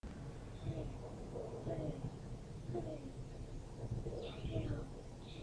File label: cello